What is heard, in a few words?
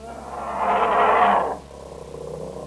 BEAR